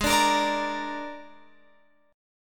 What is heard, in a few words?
Ab Suspended 2nd Flat 5th chord, Guitar, Open F tuning; Absus2b5; strummed; chordshape